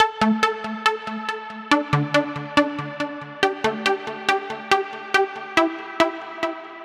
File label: 140 Am, Melodic Loops; M8; Electronisounds